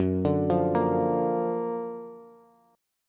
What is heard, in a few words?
Gb Augmented Major 7th, down, Bass; arpeggiated